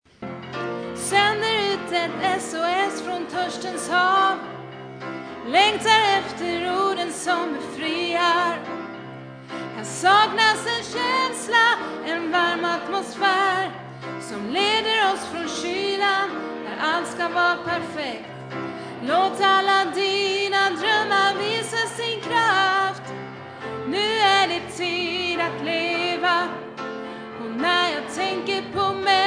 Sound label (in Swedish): sopran